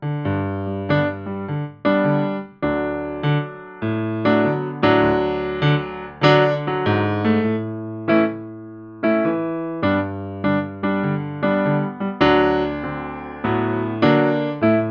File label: Piano